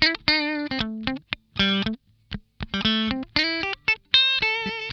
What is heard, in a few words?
DAMPED, Funk Guitar; 90 s Sample CDs; Zero G